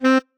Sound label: marimba bat; ゆめ2っき